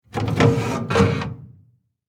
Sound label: Household Fireplace Doors Open Glass; Fireplaces; SFX Bible 6